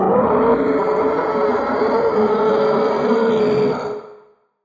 T rex roar; soundscrate

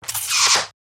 web shooter; soundscrate

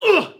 man injured grunt; soundscrate

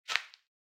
footfall outdoor step; soundscrate

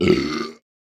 burp

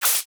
snake attack

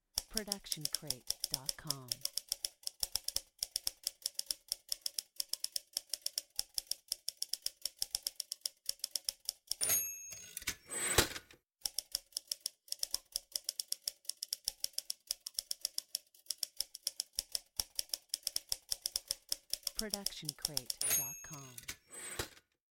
loopable classic typewriter; soundscrate

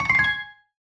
click fx tonal; soundscrate

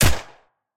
Action Gun Shot

